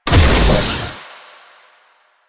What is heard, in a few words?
Service Door Open; Con Junction; Warframe; Ambience; Entrati